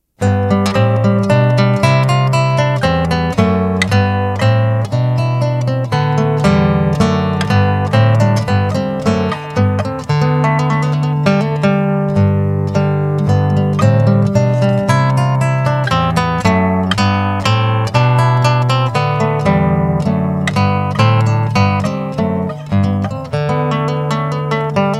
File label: minuet in g